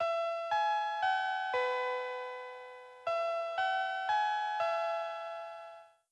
School Bell; blue archive

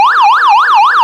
yelp, sirens; code3 3955

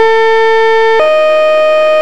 hilo, sirens; code3 sweden

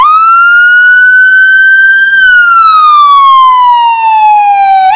wail, sirens; whelen gamma

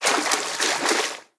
Water Run; blue archive

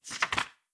Book; blue archive

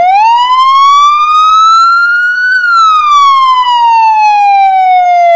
wail, sirens; feniex storm pro

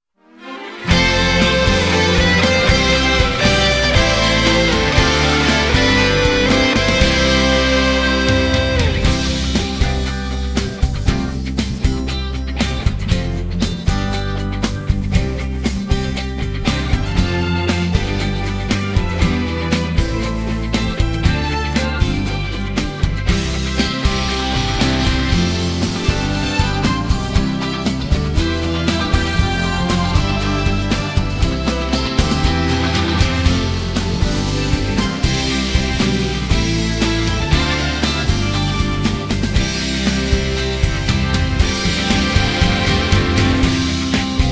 korail company song inst; Train Announce

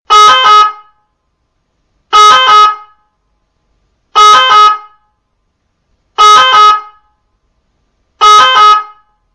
sirens french

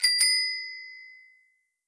Bike Bell; blue archive

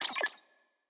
Scribble High; blue archive

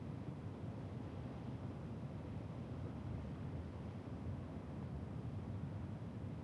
Onsen; blue archive

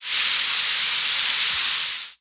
Shower; blue archive

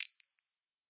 Emoticon Motion Bulb; blue archive

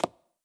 Foot Step; blue archive

